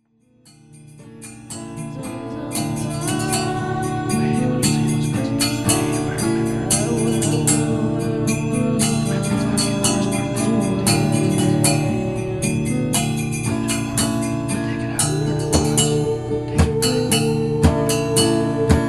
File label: silvery strong-water lullabye